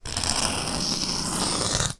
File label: Nails on Chalkboard; soundscrate